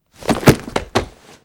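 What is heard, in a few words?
weapon foley case bomb defuse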